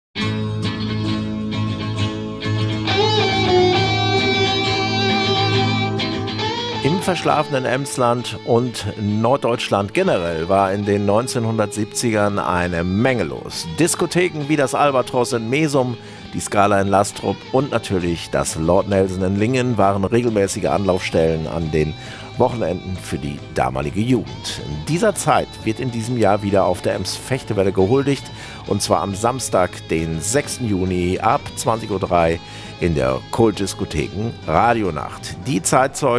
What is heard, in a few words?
jingle; kultdisko nacht 2026; kult diskotheken radionacht ems vechte welle